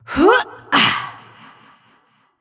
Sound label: Grunt Jump; Princess; Paladins